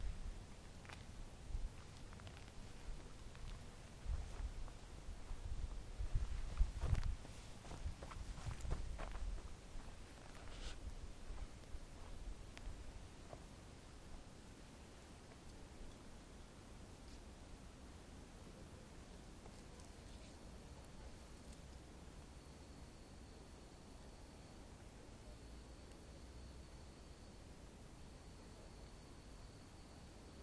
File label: sound of walking around at night